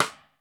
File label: ROOM P STIK, ROOM KITS; Crypton - DrumBase5000